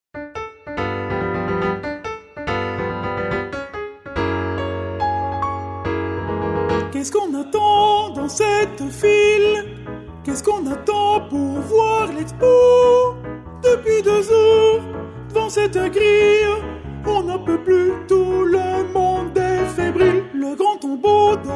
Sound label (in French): SUB ALTO